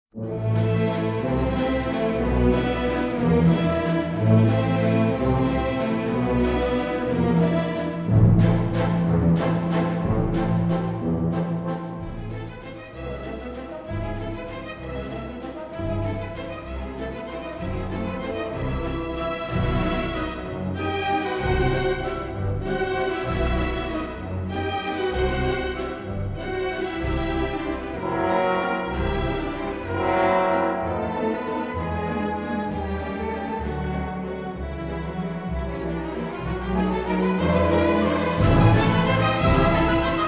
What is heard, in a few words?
Khatchatourian Valse